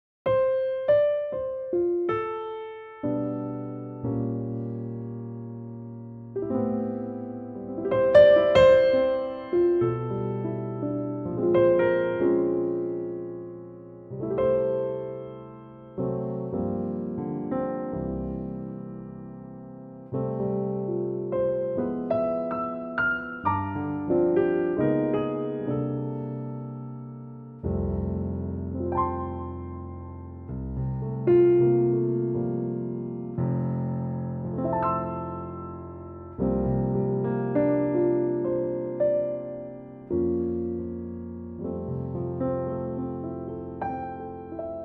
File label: F Piano